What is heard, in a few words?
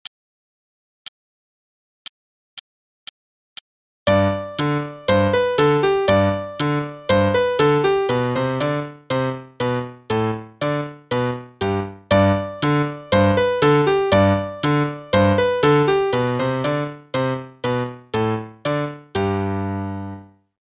Musette (qn=120); Faber Adult All-in-One; Adult All-in-One Book 1